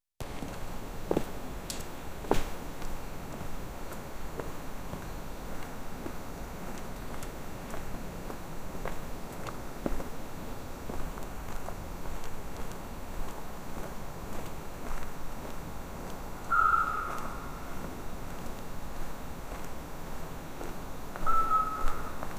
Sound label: a creepy ambience with